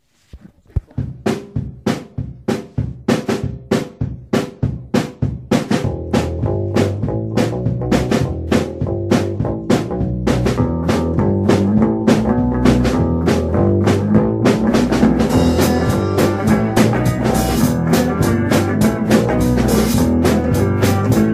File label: Jazz